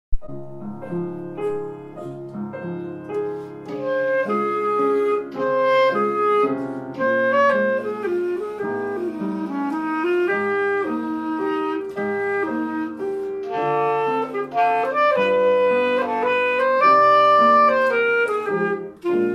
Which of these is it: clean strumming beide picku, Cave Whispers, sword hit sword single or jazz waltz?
jazz waltz